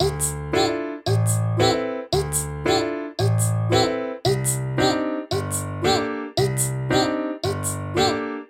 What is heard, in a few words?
2 time piano